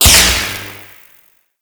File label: Shipyards Robot Laser Activate; Warframe; Grineer Shipyards